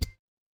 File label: ui alert